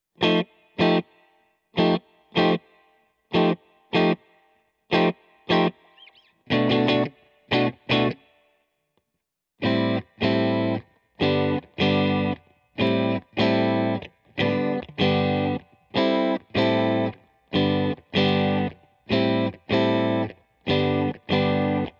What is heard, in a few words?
Guitar, amp samples